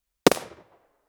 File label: mpx 9mm single gunshot